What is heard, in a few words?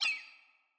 graphics high short glitch; soundscrate